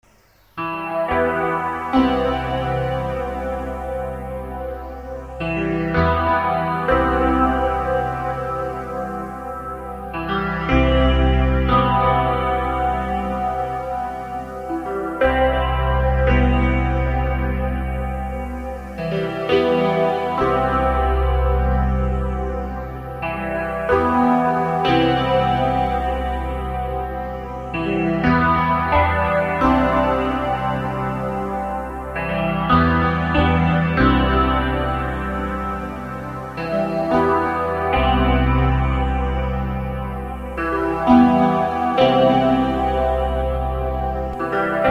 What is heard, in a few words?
piano